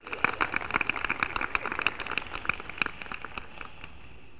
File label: APLAUS